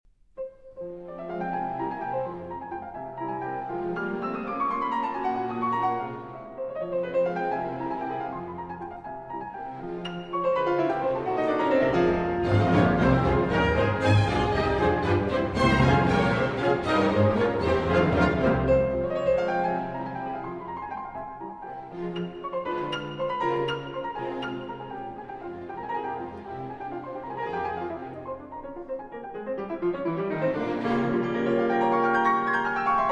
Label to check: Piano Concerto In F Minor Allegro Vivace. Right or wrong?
Right